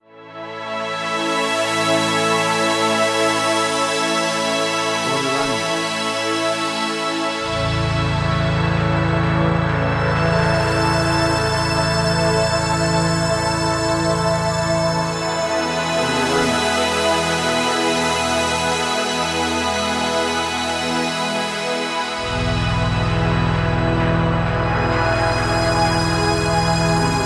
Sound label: Basic Pad Atmosphere